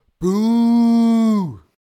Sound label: Boo; Pitch Black Audio Pong